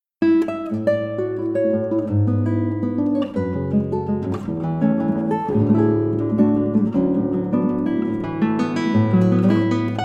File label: Romantic Etude